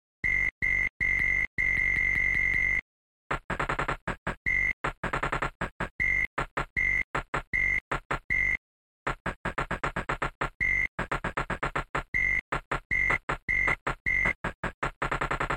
main bgm; 1942

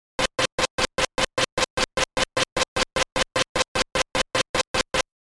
moving by chains

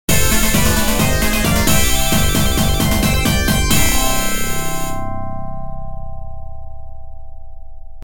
game over

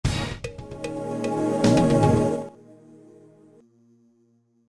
game over

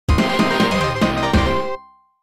game over; street fighter ii turbo - hyper fighting

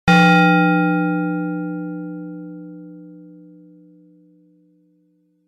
arcade coin; marble madness

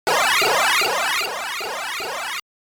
shoot a fireball

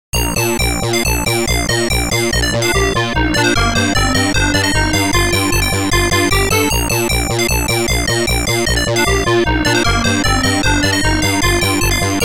bgm; bubble bobble

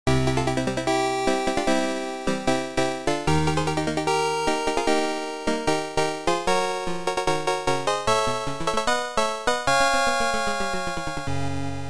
achievement bgm

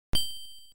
stage clear

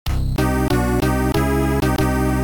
game over

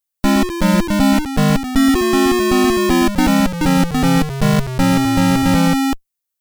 level segue; crystal castles

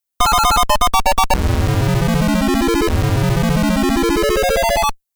warp

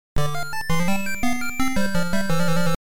arcade game start; super qix

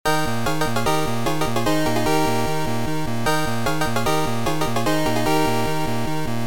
boss clear

